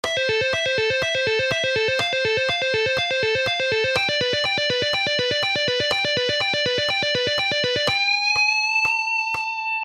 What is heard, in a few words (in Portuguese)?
Exercício tapping